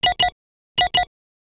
Racer Computer Idle; gnarled forest

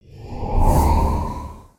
Cam Select to Create

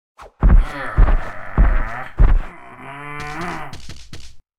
Angry, emotes

